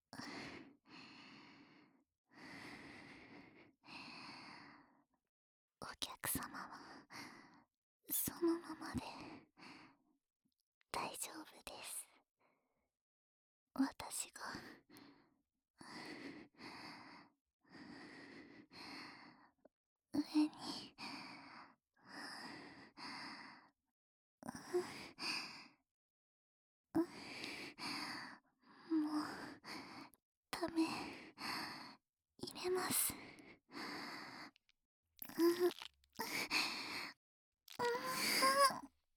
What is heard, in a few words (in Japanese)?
騎乗位で挿入, ASMR